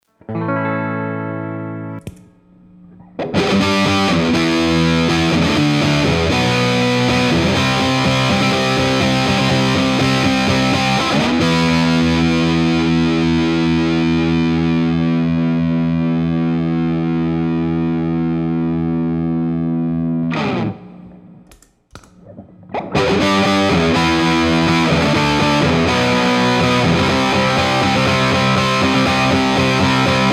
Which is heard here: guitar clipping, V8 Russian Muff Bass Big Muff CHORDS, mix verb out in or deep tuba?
V8 Russian Muff Bass Big Muff CHORDS